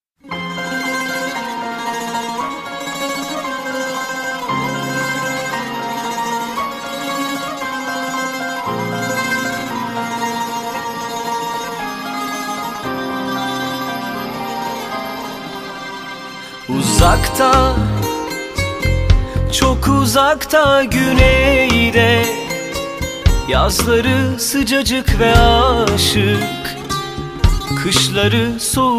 Turkish Song

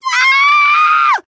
Squee

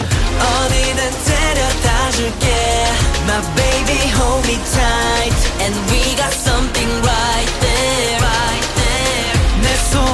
chorus

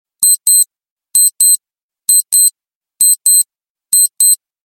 Casio Chime

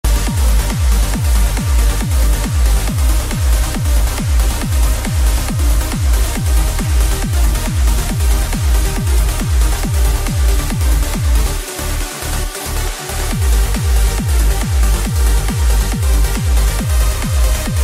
Euphoric Trance

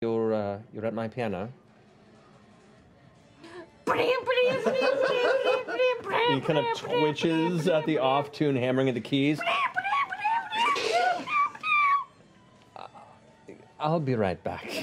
plays piano; Jester

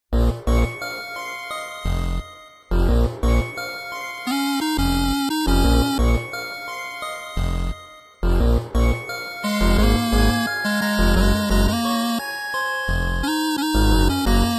8bit

